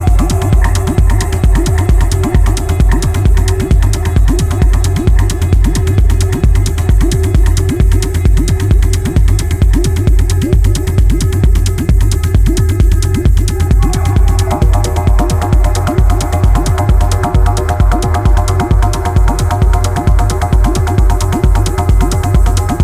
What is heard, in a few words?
Dual Synth